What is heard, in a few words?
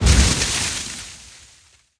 snowman; World of Tanks Blitz